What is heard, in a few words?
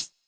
hihat; Electro; futurefunk